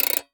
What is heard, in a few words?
Alarm Clock Wind; Clocks, Watches and Timers; Blastwave